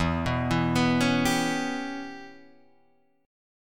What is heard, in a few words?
E Minor 6th guitar chord, Open D